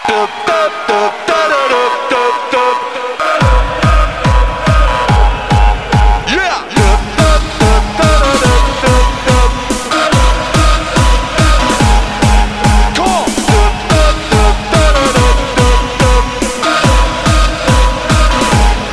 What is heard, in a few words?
dub